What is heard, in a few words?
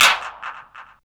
claps, techno; Techno ID; single-sounds; single-drums